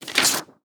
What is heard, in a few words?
copier remove paper